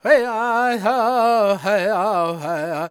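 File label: E minor, World Voices, Lakota; Spectrasonics Vocal Planet CD5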